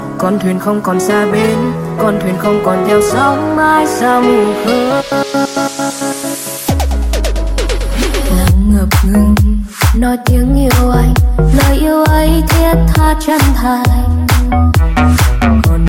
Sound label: Remix; Tiktok